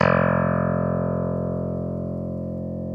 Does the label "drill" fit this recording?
No